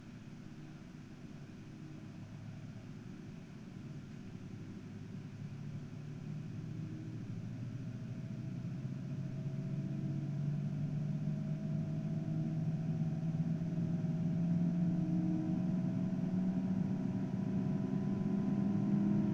Langzeit 60mm

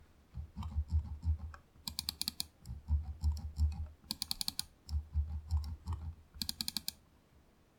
Mausrad; Glorious Model i

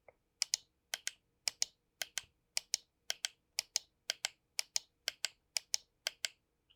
Maustasten

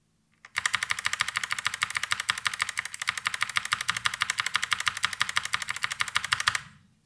MSI GK71 Sonic Blue ESC haemmern

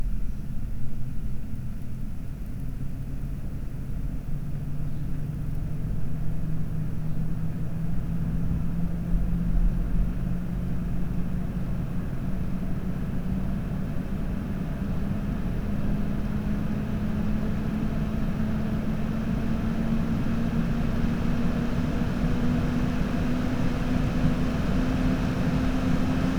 Langzeit 60mm

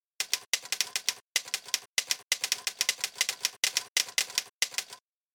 Sound Feedback Schreibmaschine

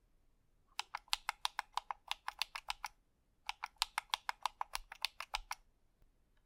Schultertasten

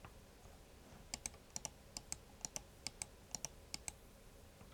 Maustasten

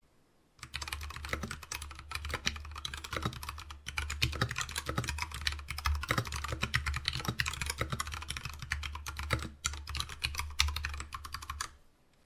Normales Tippen

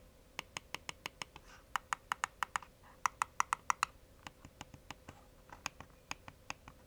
seitentasten

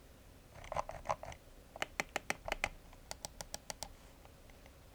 Sound Mausrad Taste DPI

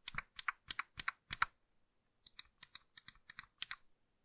Maus 5 mal Rechts u. Linksklick

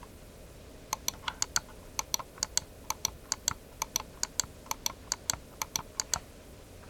Seitentasten

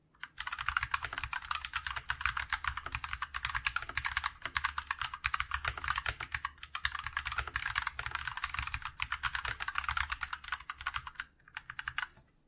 SGK 50 S4 Kail BOX Brown Normales Tippen

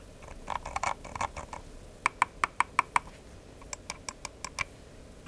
Mausrad Mausklick DPI

